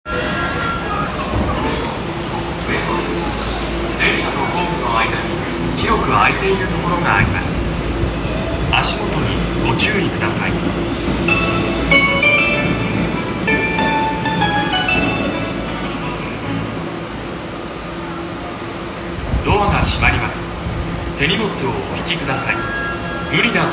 銀座線上野東, train sounds

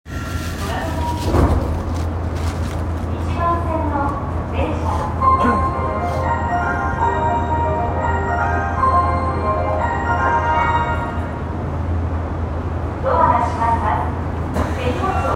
日比谷線虎ノ門ヒルズ, train sounds

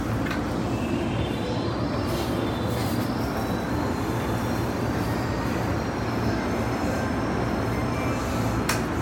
京浜東北線川崎南, train sounds

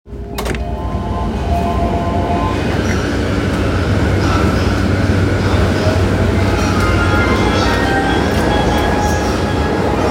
JR山手線神田CW

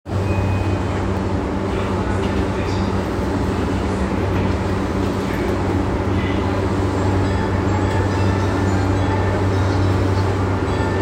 南北線後楽園南, train sounds

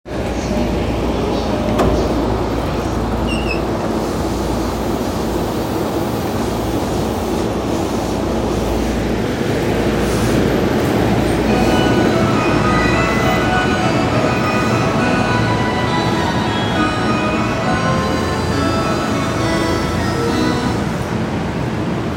中央線快速四ツ谷東, train sounds

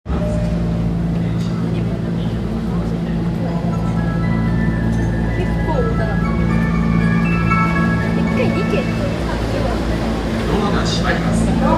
丸ノ内線大手町東

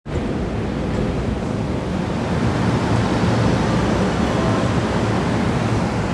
池袋線武蔵藤沢東, train sounds; 西武

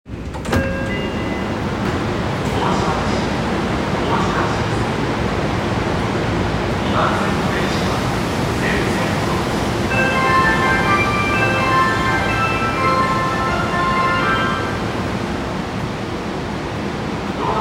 有楽町線麹町東, train sounds

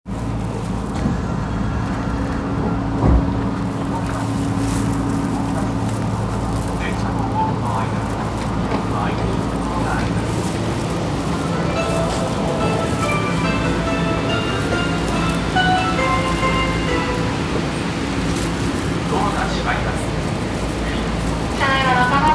銀座線日本橋東, train sounds

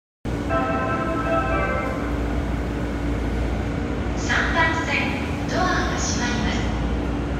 JR 常磐線 北千住 南, train sounds

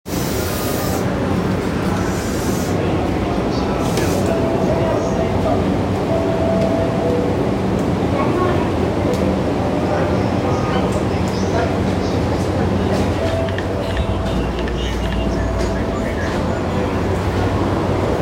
JR横須賀線大船北, train sounds

